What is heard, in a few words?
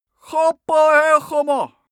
male shout arabian